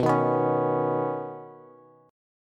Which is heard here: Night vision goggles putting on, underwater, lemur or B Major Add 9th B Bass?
B Major Add 9th B Bass